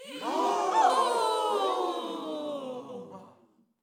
Audience Pityingly

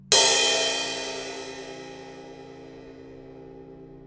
Symbol Long Ring

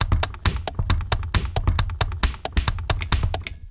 135 Bmp Loop

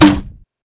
Grenade Fire

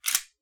Slide Pull

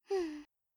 Hmm Little Boy Or Girl Noise

